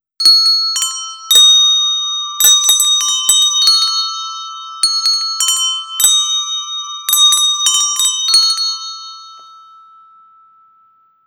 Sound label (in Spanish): campanas